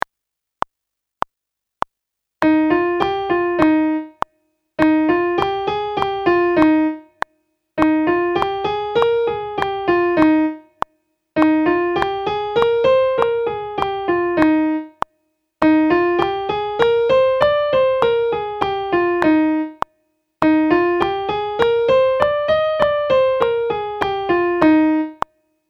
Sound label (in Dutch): Toonladder D# oefening piano